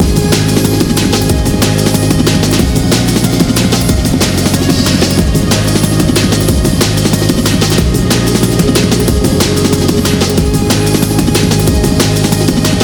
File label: random loop